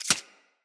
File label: Arachnoid Micro Step Snow; Corpus